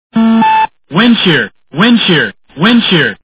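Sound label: warn windshear; Warning Windshear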